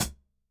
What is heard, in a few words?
HIHAT EDGE, ELECTRO KIT